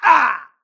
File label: grunt death quick; Titanfall 2